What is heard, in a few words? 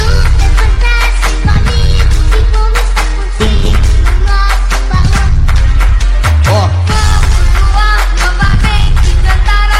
funk remix